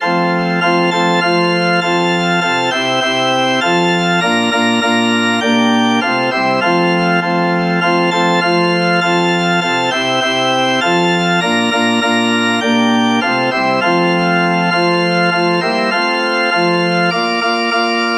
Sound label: organ